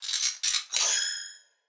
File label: Sci-Fi Weapons-Loading Fx